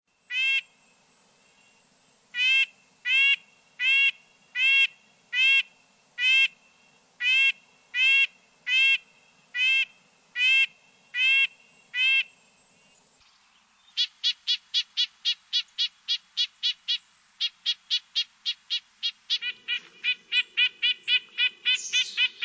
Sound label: Red-breasted Nuthatch